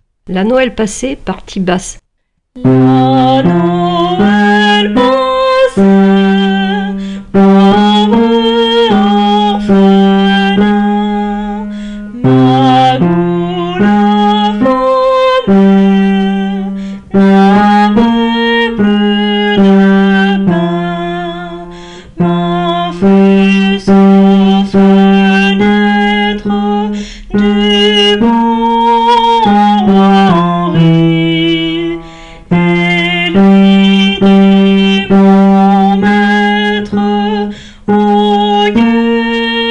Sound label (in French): Basse; La Noel Passee